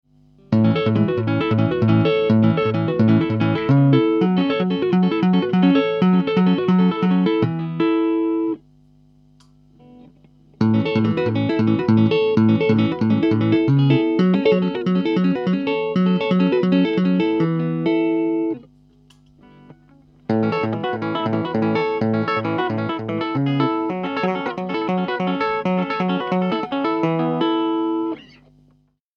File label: clean taping